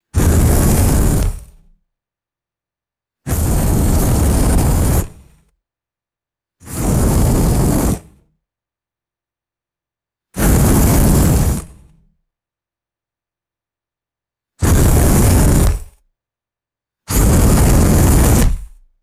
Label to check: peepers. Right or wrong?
Wrong